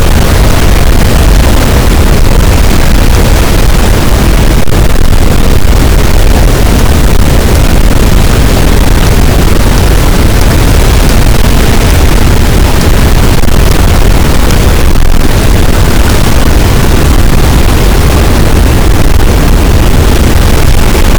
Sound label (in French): cachalot positif, boat, Physeter Macrocephalus